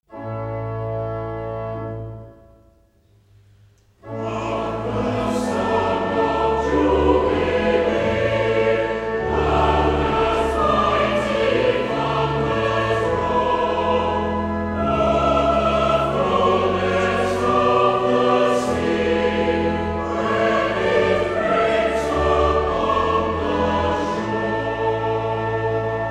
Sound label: Choir, hymns